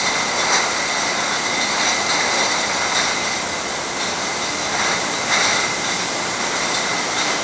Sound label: bottling, industry; AKAI S6000 CD-ROM - Volume 6; Tools